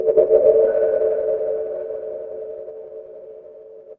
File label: magic scifi alien